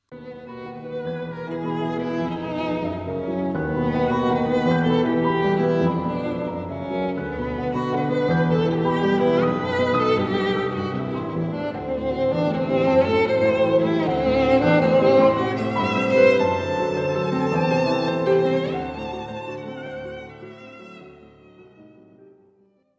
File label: cross rhythm